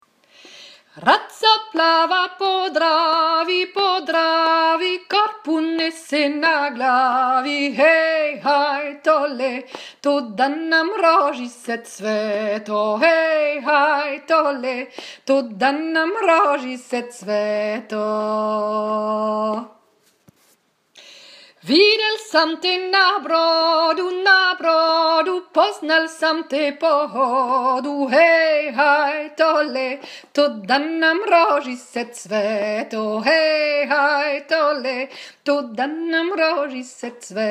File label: Raca plava po dravi croate